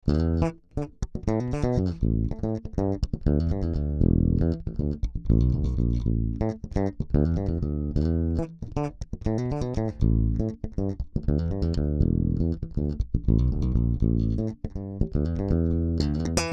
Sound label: PRS Grainger 4 Strg. Passive